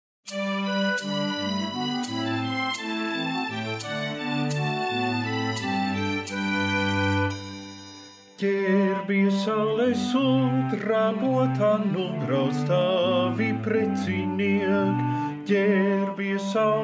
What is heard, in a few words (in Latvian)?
gerbies tenors